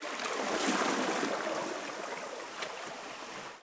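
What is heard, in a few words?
lake wave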